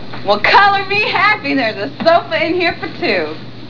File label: jungle lady; cstrike